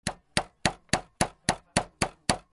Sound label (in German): auf holz klopfen